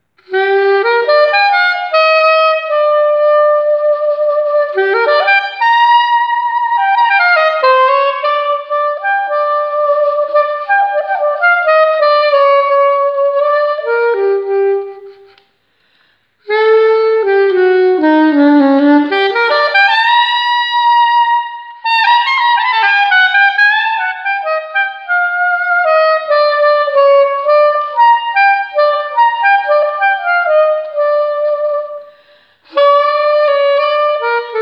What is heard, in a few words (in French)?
saxoprane impro blues